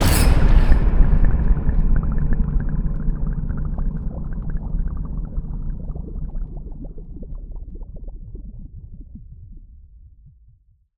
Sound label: blast bubbled remains, Cinematic Metal Impacts